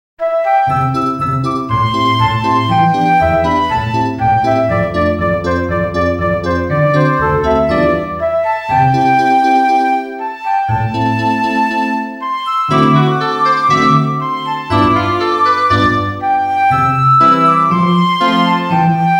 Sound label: Forest Going to the Forest Humming; Chunsoft 20th Anniversary Furai no Shiren 20th Special Collection